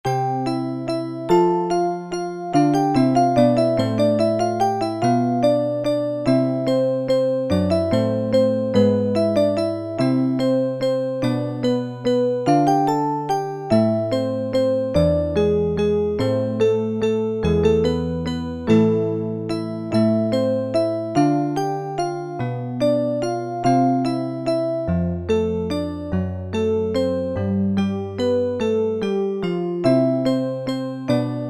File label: menuet